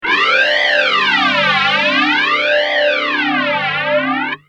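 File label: guitar, ADA flanger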